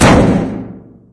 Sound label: metal barrel impact hard